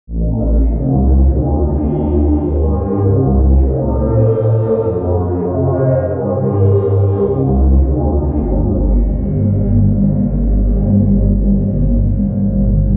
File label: Bass